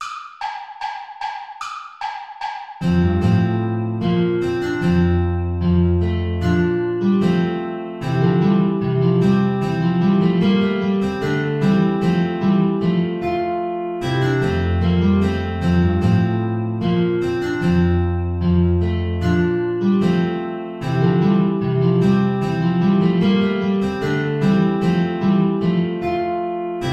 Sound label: play along without flute; praetorius michael; ballet des baccanales